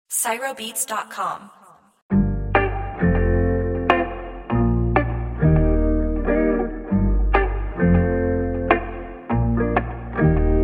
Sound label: Afro Beat Instrumental